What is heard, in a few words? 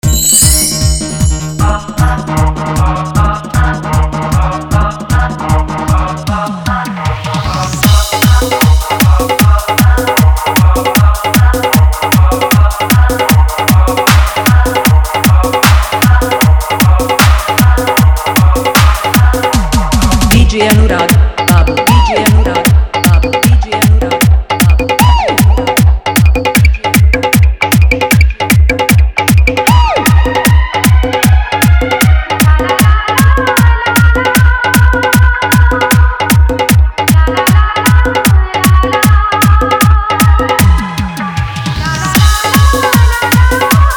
Full Dhollki Bass Dance Mix